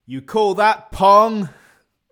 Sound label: Boo; Pitch Black Audio Pong